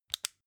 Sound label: flashlight off fast